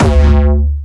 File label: D2, Jumpstyle Kick; Bassdrums; Jumpstyle Multis; vengeance essential club sounds-3; VEC3 Bassdrums